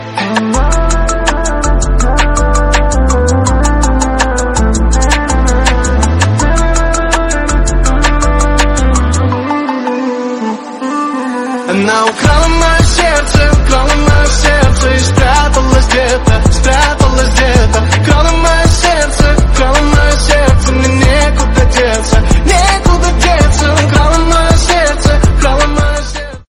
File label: musical tone ringtone